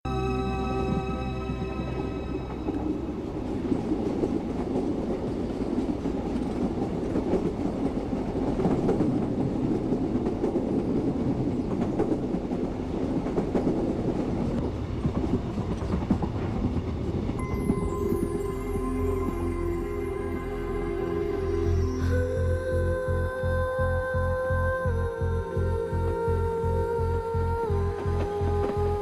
Sad Tone

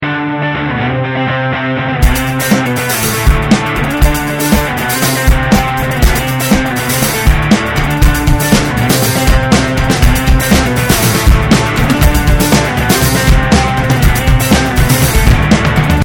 Garageband Rock

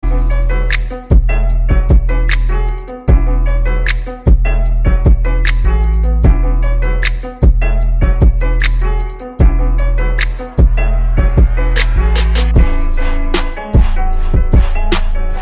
Patty Cake Beat

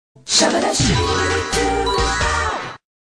Skip Beat Commercial

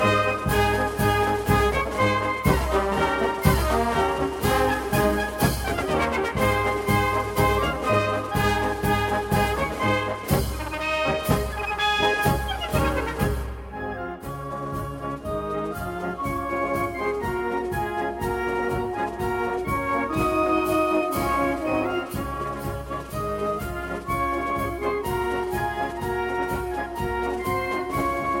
Military march

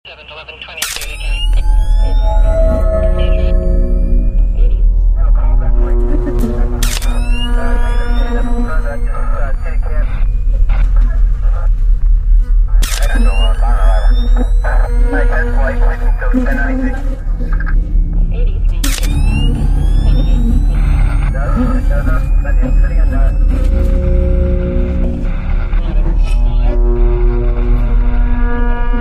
Camera; Forensic

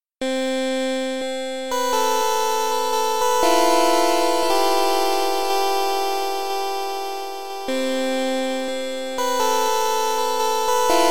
basketball

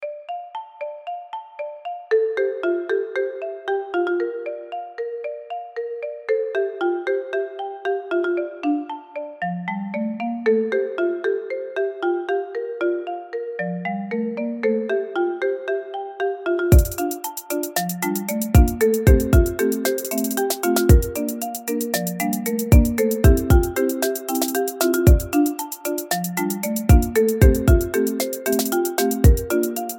Trap

